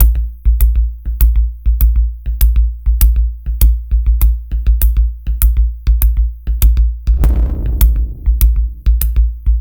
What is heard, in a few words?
Structured Rhythm, IDM Rhythms